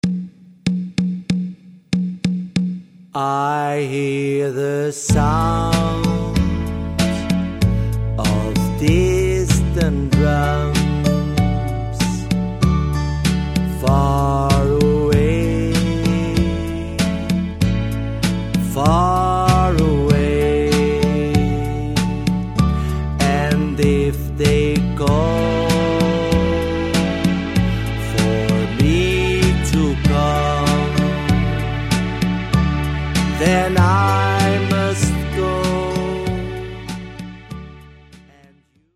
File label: Distant drums